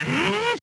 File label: Lunge; Joblin Freelancer Lunge New